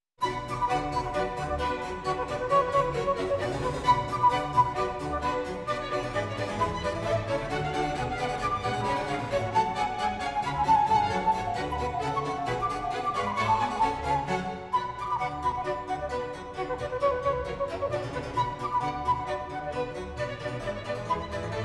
Overture (Suite) No. 2 in B Minor, BWV 1067 VII. Badinerie; 最爱的长笛曲